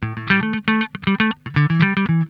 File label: FULL PICK, Funk Guitar; 90 s Sample CDs; Zero G